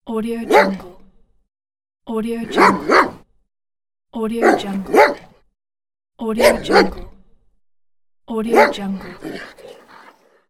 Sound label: dog barking